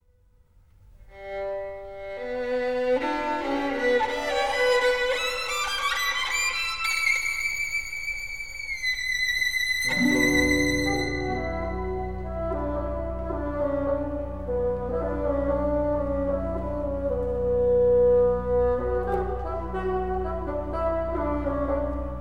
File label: Scheherazade Solo; Scottish National; Jarvi; scheherazade mvt 2